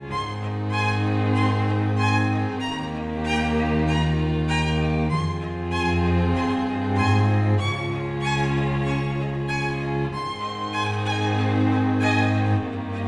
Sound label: Strings